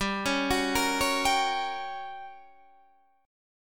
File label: G Minor Major 7th Flat 5th, G, down, Guitar, Open D